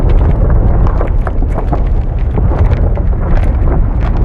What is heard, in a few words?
cliff fall; Nut Boarding